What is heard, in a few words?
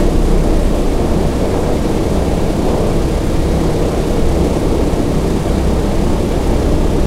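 b17 cockpit left, engines; War Thunder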